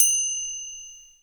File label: FINGER CYMBAL; Greytsounds VOL-1; ORCH PERC 4